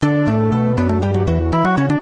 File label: win